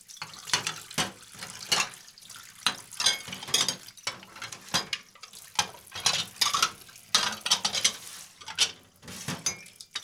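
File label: DISHWASHING, Daily Life; AKAI S6000 CD-ROM - Volume 6; DISHES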